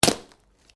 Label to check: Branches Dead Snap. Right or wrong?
Right